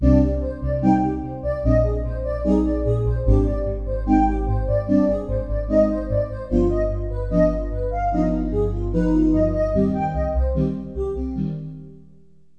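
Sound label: syn vox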